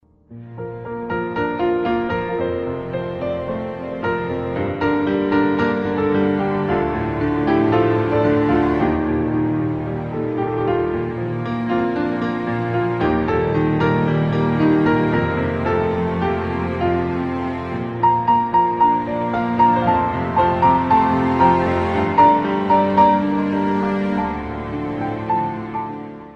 Beautiful Piano

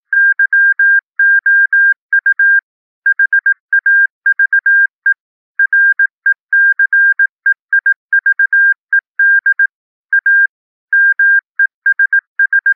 Morse Code; Messaje

